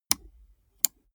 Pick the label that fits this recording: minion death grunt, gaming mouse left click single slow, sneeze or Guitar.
gaming mouse left click single slow